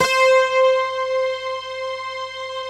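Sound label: bright guitar strings, Slow Bright Guitar Strings; Optical Media International - Sonic Images Library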